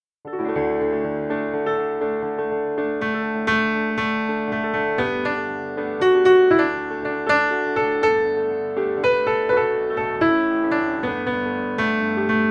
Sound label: Melody; Merida; Mickey Wndr Book